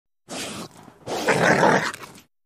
Coyote Growl. Single Growl With Nasal Exhales. Medium Perspective.